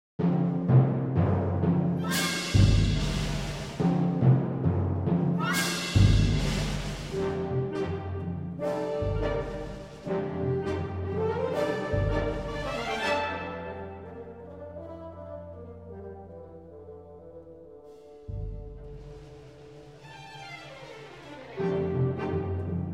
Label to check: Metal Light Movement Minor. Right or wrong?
Wrong